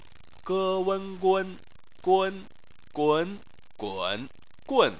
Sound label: gun